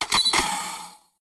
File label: rocket mode, weapons